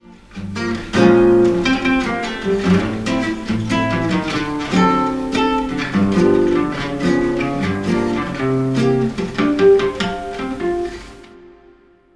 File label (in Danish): Akustisk; Hjemmelavet Fra Gamle Kasettebaand